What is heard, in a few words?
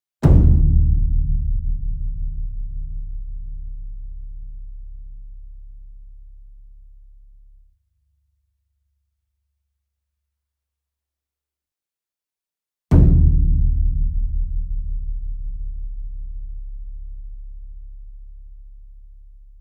ENSEMBLE DRUM Bass Drum Concert And Taiko Hit Near; boom library; Cinematic Strikes Construction Kit